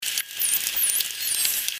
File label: SW, dolphins signatures; Nikita